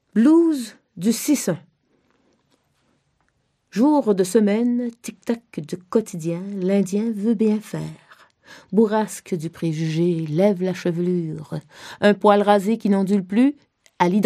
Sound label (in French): blues